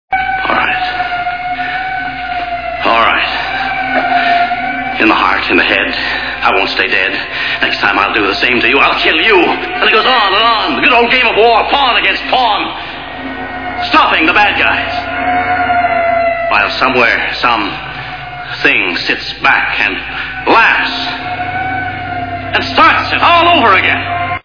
laughs

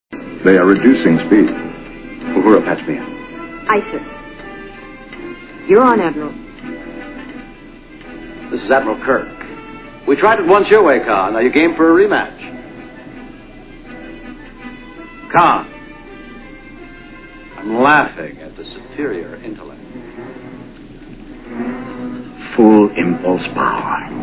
laughing

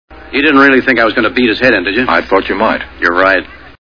beat

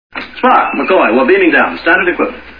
beaming

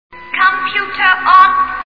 computer

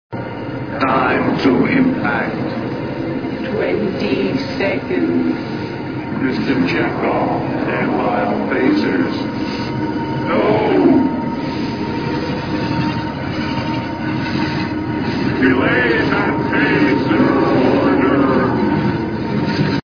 phasers

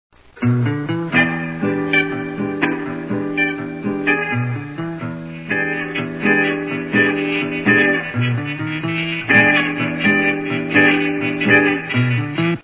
music

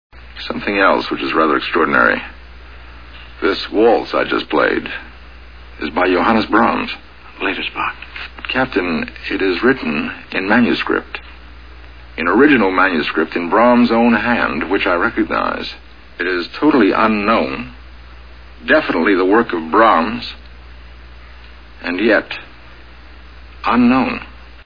waltz

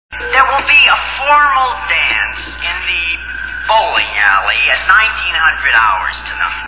bowling

